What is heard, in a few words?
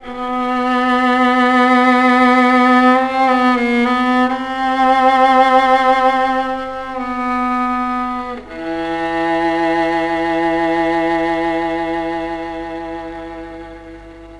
viola